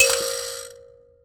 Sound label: Finger Hit, Prepared Kit; Battery 3 Sample Kits